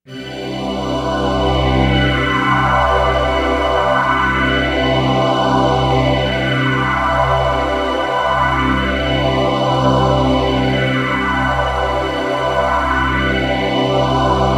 polypad stereo polyphase

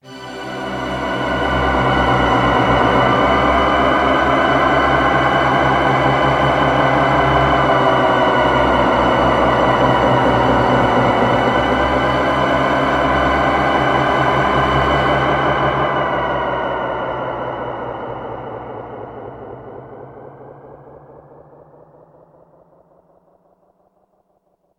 poly pad e1005

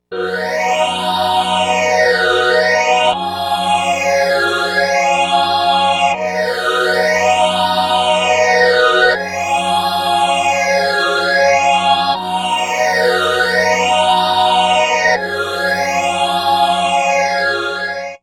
stringtron

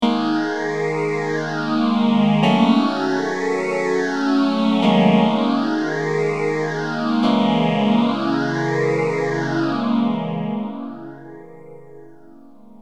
m1000 phase100

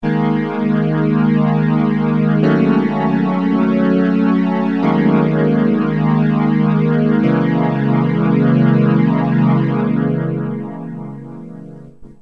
m1000 mti ensemble

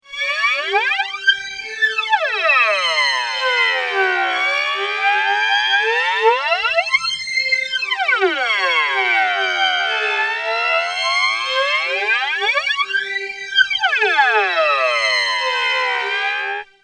strings, ADA flanger; x5dr

